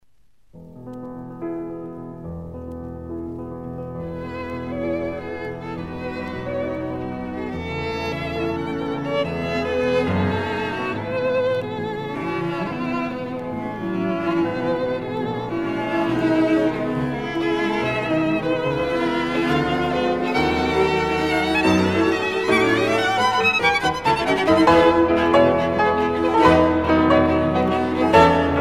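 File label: piano quartet; goetz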